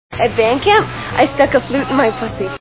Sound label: flute; American Pie; Michelle: "At band camp I stuck a flute in my pussy."